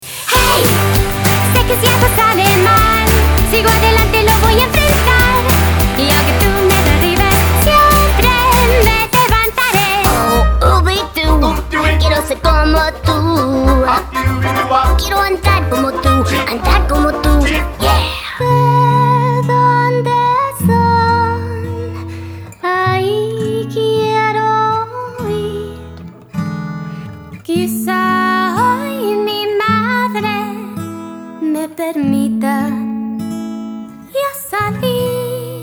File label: Spanish Singing